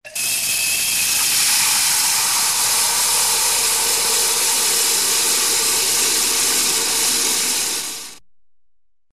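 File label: siphon tap with sparkling water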